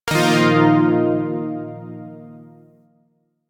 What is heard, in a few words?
Shawzin Blues Chord I; Music; Warframe; Zariman Shawzin